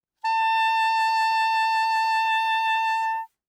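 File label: Soprano Saxophone vibrato ff A5; Woodwinds; Bb Soprano Saxophone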